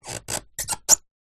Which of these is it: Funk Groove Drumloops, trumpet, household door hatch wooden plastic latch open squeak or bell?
household door hatch wooden plastic latch open squeak